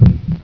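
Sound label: kodou, heartbeat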